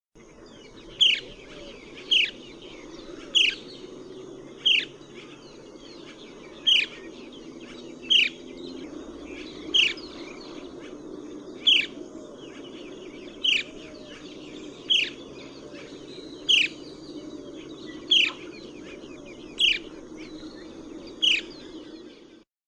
Megarhynchus pitangua

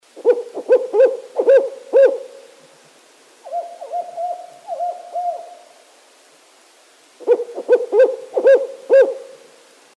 Strix fulvescens; S. M. Papalo

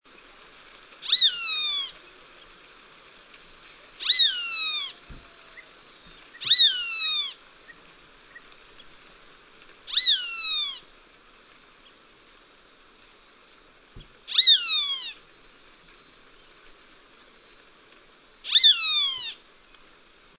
Buteo magnirostris

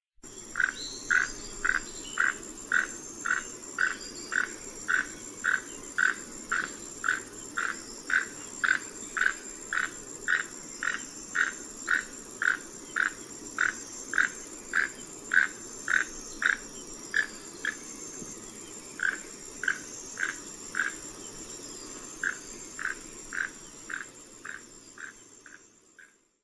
Ramphastos sulphuratus

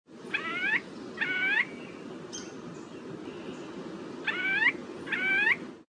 Automolus rubiginosus

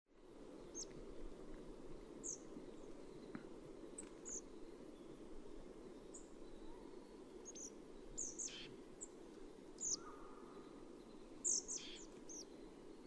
Parus sclateri

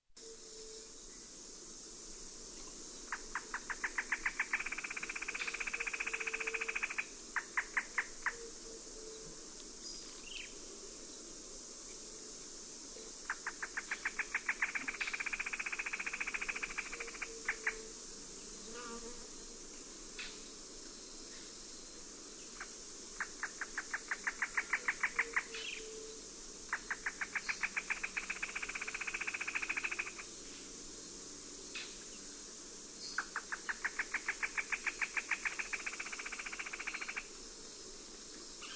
Trogon melanocephalus

